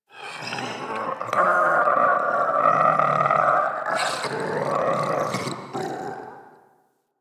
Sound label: Alchemy Lab Create Vocals; Warframe; Hub; Liset Alchemy Lab